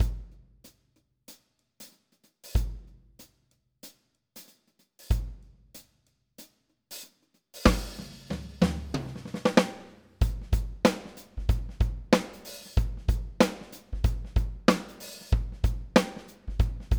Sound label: Superior Drummer 3 Premier Genista Soul 94 bpm; toontrack